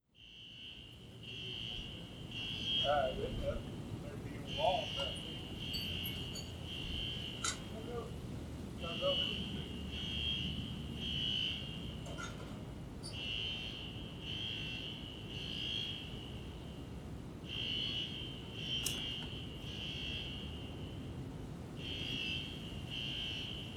Reversed One and a Half Speed Noise Reduction Amplified